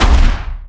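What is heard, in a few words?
grenade flash; RSIXDEMO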